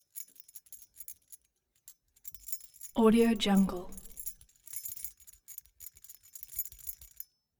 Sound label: object key key ring small rattle air sequence soft